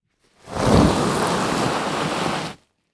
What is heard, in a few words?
Impact Body Drag Through Leaf Debris; Impacts